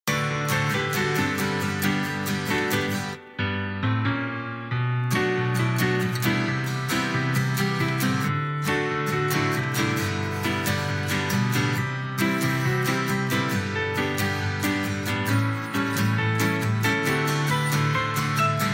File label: piano and guitar short karaoke